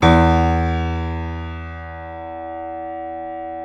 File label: PNO FF; Synclavier Sampler Library